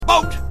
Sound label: boat; weapon; Nuclear Tech